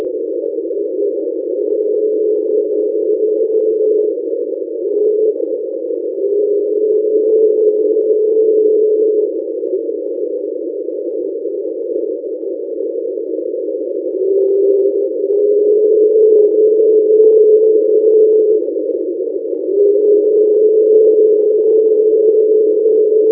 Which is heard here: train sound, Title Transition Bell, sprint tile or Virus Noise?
train sound